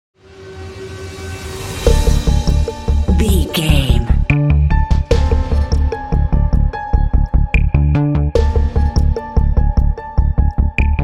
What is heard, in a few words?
fear and tension